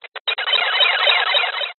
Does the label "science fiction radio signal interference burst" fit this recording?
Yes